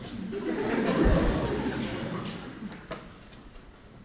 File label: Laugh